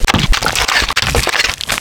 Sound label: Scorpion, Wavetables; Cymatics; Serum; Animals for Serum - Gold Edition